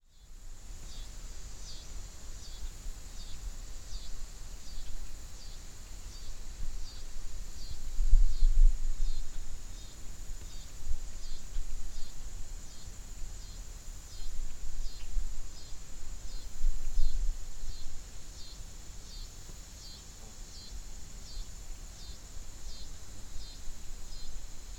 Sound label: Coastal Scissor Grinder, cicadas; Coastal Scissor Grinder Habitat in Kent County, Delaware